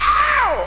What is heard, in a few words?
oww